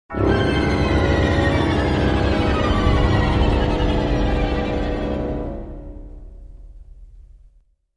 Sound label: battle suspense; Knights of the Old Republic II-The Sith Lords